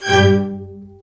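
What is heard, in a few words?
String Section; CMI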